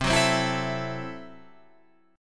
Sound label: C 6th Flat 5th C down